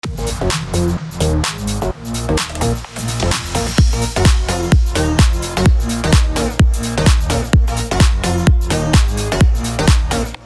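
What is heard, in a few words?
BED FUTURE HOUSE A# minor 128BPM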